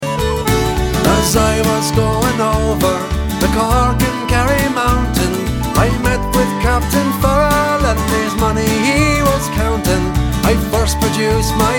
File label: irish celtic